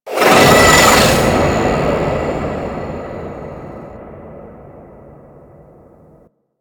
Void Tower Capture Successful; Warframe

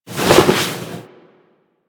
Misc Foley; Warframe; Tenno

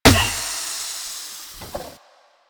Car Tire Pop

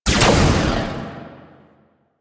Shot, Spear Gun; BE Ferrox

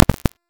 Fist Claw Spike Despawn; Warframe; Tenno; Incarnon

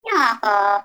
Baby Vocal Tired; Warframe; Nokko Colony

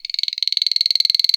Ext Insect Close, Ambience

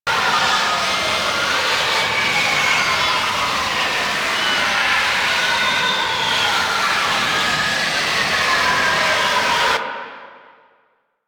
Absorb Ability Loop; Warframe; Infested; Zealoid Prelate

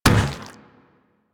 Zombie Run Metal E, Footsteps; Warframe; Infested

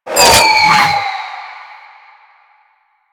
Grenade Proj; Warframe; Lasrian; Lasrian Soldiers; Weapons; Lasrian Goonadier Grenade

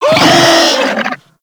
Infested Charger Death, Enemies; Warframe

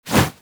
Arm Swing Foley Heavy; Warframe; Purgatory Warrior

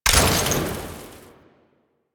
Ammo Pickup Heat; Ammo Ability; DE; Warframe; Frumentarius

